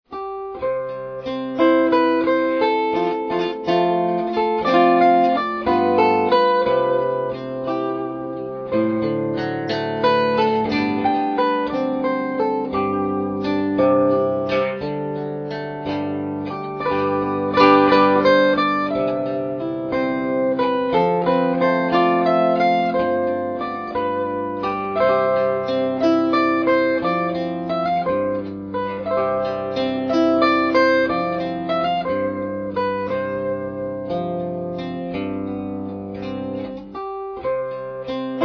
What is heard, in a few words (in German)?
Komm liebe Zither komm, Zither